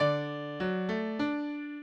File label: minuet, mozart